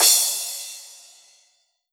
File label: Yamaha RM50 Crash Cymbal